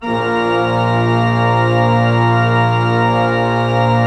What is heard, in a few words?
ORG, CHURCH ORGAN; Roland - LA Composer vol. 1